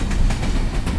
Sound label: train; trainescape